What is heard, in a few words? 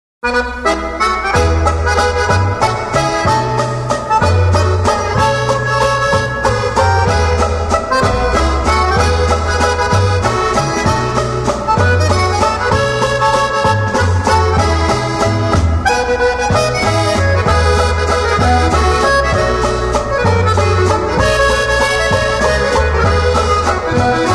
Caroline Waltz, accordions